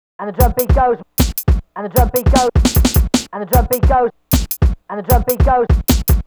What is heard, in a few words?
drums, Hardcore Breakbeat, 153 Bmin; Big Fish Audio; Hardcore Breakbeat Action